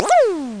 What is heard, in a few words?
zap; Sound Library 2000; Wayzata Technology